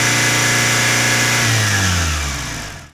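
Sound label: med constant, Engines; E-MU Producer Series Vol. 3 – Hollywood Sound Effects; Ambient Sounds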